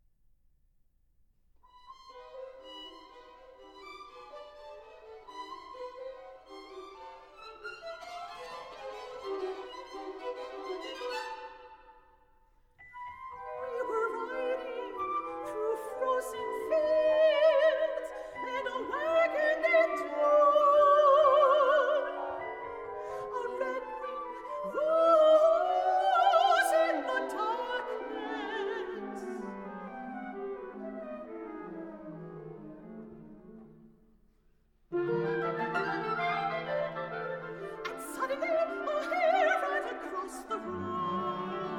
songs for soprano and orchestra

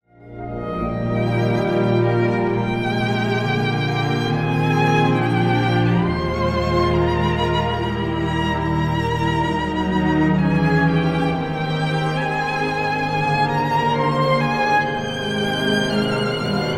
violin con mvt. ii

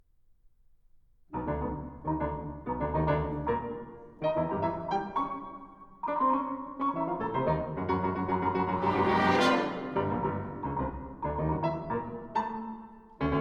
serenade for piano and chamber orchestra burlesco

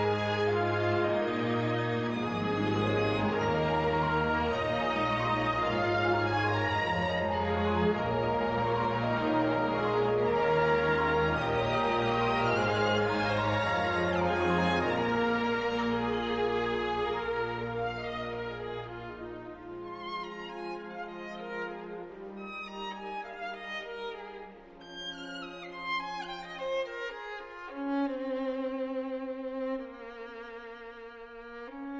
violin concerto

